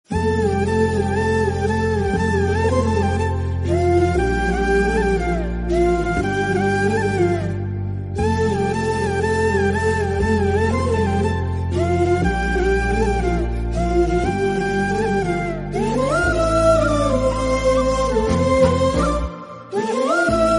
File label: Flute Bgm; Policeodu; Telugu